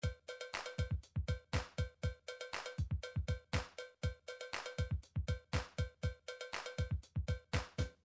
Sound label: rpm 120, midi